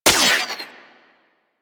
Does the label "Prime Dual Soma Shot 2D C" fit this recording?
Yes